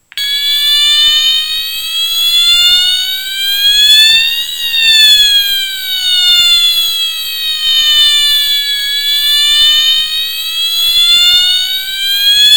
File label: Siren; Fire Alarms; Fire Alarm Collection